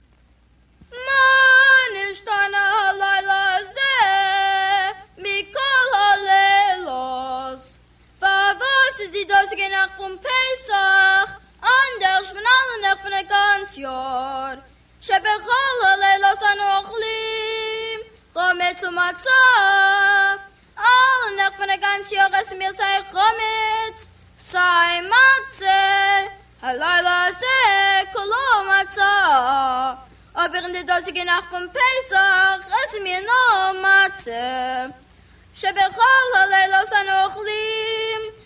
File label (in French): manishtana yeled yiddish, chants du Seder de Pessah